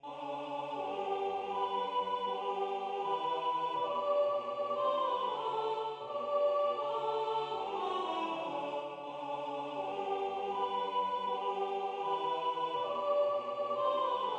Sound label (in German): Sopran; Spazzacamin